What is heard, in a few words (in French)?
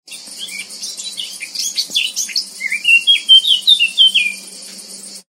sonnerie chant